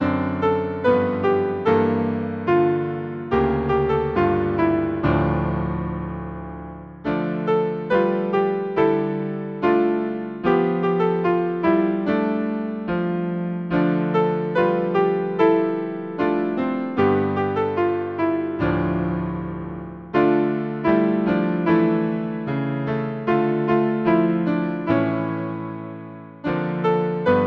Hymn, piano; Now the green blade riseth; Noel Nouvelet

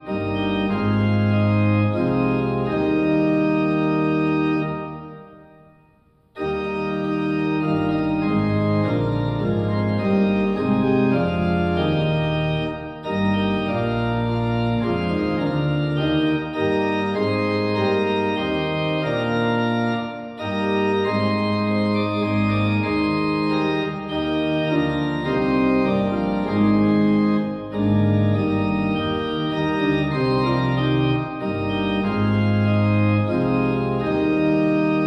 Hymn organ; Be thou my vision; Slane

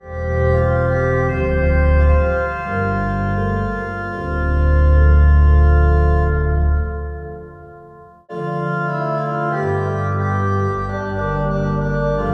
organ; bickford; Hymnal 1982

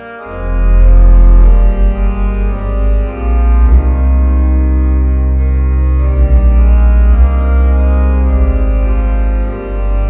organ; conditor alme siderum; Hymnal 1982

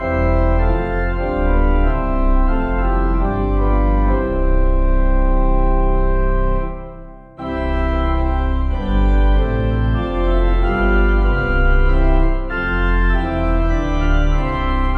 Pipe, hymn; In Your Mercy; Halton Holgate; Hymnal 1982

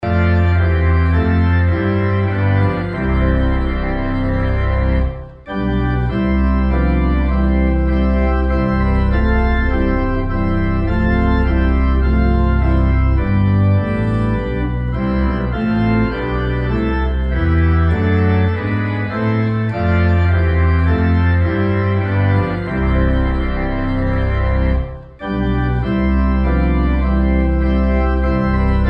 organ; Hymnal 1982

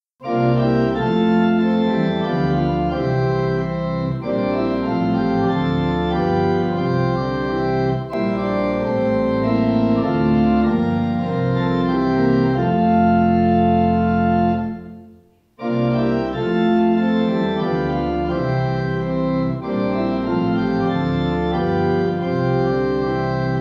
Hymn, organ; Like the murmer of the Doves song; Bridegroom

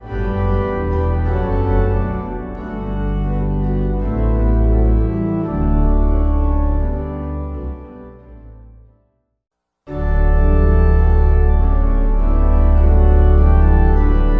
organ; Love's Redeeming work is done; Ressurexit Johnson; Hymnal 1982

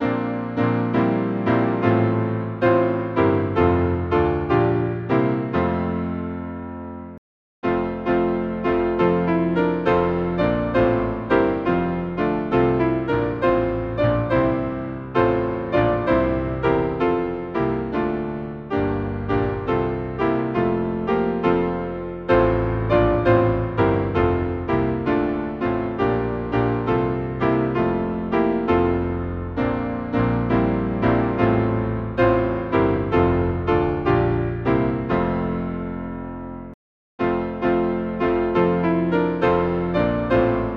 Hymn, piano, In dulci jubilo; Good Christians friends rejoice

